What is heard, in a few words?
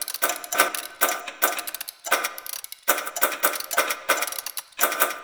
Clock Machine Small Malfunction Loop; Ambience; Props; Warframe; Corpus Railjack